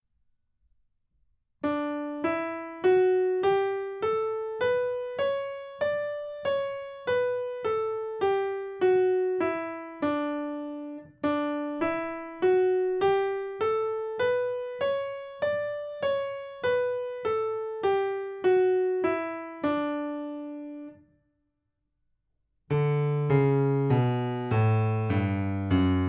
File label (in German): D-Dur Tonleiter Übung